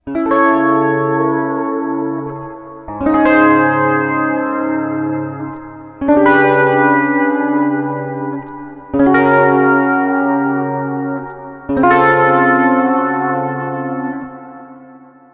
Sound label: triad bend, bender; bowden b bender